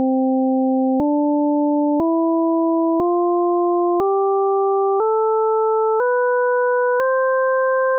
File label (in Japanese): 平均律のドレミ; 実際に平均律と純正律の音の違いを体験してみましょう。; classical music